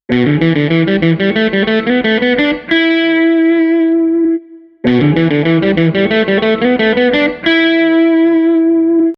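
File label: bassman worn out to new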